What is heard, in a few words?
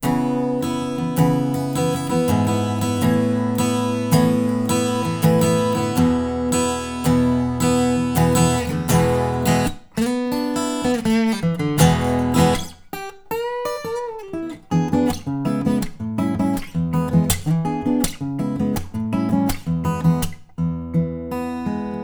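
Distance Acoustic Guitar Mid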